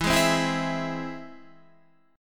E Augmented, Guitar, Standard; E+ strummed; JGuitar

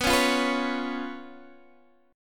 B Suspended 2nd Flat 5th, Guitar, Open D

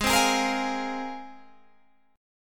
G sharp 6th Add 9th G sharp Guitar Standard; G#6add9 strummed

